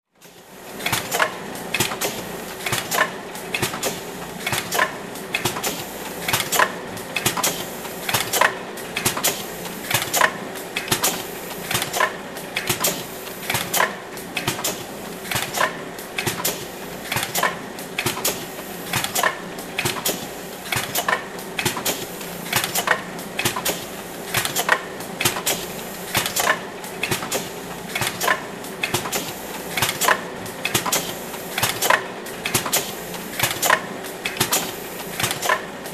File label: Labeling Machine Running